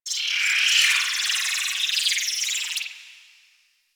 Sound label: Glowing Tree Insect; ambience; props; one offs; Warframe; Venus Open World; Venus Landscape